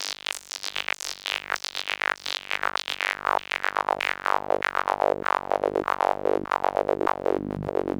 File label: Gated sweep (120BPM); creative gate; Loops; musicradar